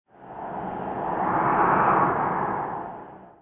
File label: wind gust mono; amb elements; modern warfare 2